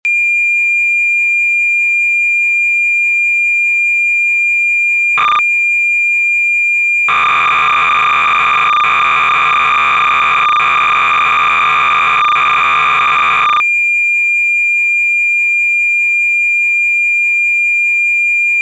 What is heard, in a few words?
fsk